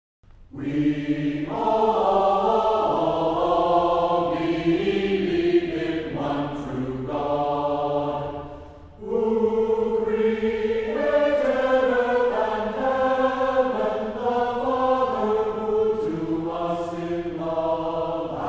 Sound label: Choir, organ; We All Believe In One True God